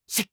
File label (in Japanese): 攻撃